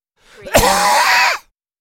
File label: Male Cough; beanstalk audio